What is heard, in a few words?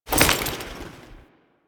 Foley Step; Warframe; Kahl